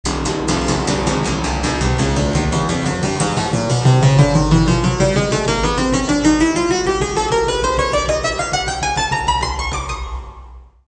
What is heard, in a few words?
Clavichord